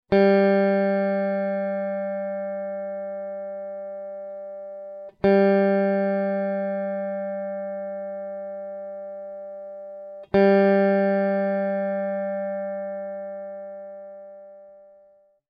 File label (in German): Stimmton g